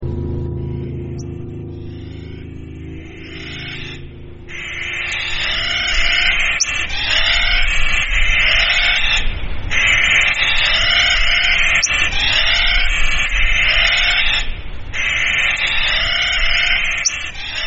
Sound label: nutcracker counter-singing; whitebarkpine